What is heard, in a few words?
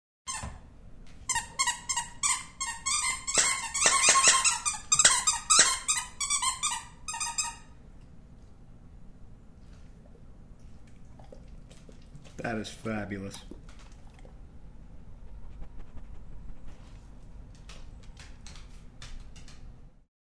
nutmeg squeak n sniff; half pint